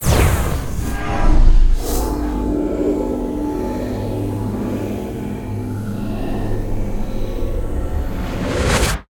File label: designed magic black summon necromancer; Sonniss GDC